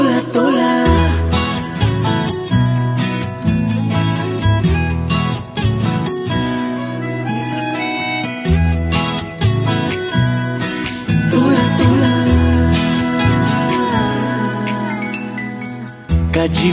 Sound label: marathi ringtone